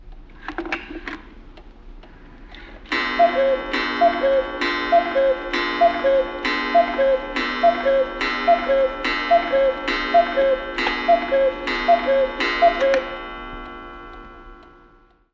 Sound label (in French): Bruitage Horloge